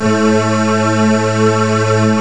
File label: DIGIT D4, D50; D50 STORY; East West; Global Sounds